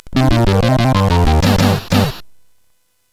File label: Push-It main, CSG8580R5; hvsc